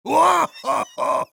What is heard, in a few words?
Heavy laughter big, de